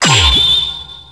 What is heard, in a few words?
ROCK